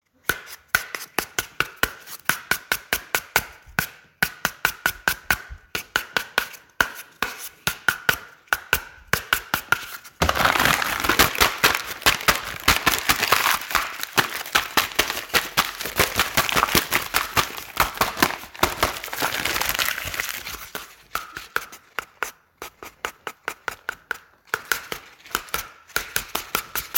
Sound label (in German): Papiermusik